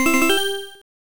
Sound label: Money Received; Doukyuusei; TurboGrafx-16